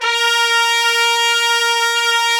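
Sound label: trumpets section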